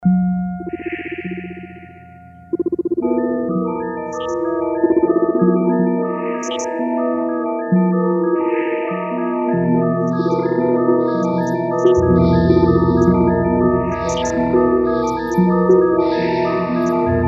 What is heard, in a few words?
Gamelan excerpt